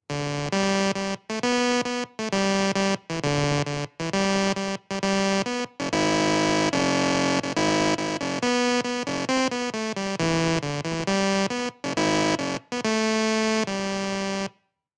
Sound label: Music bonnie banks, music on a computer; csirac; Australia